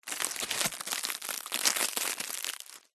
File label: foley leaf large dry crunch crush break; zapsplat